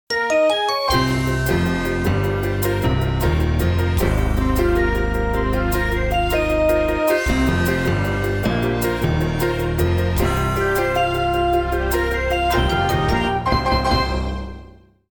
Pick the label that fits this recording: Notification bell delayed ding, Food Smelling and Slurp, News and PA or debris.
News and PA